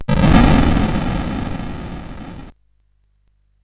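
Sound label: Moon Patrol, MOS 8580 SID chip music, games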